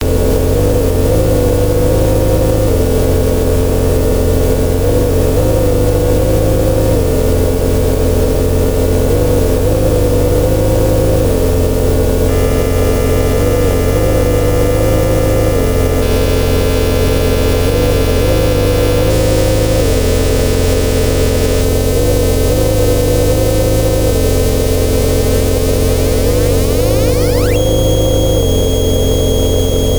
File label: RINGTONE